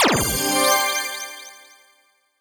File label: Jingle Rank Up!; StreetPass Mii Plaza; Ultimate Angler